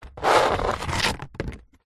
foley leaf dry fallen thick palm tropical scrape concrete; zapsplat